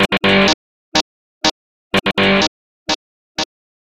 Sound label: guitar bit